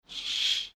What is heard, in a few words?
curtain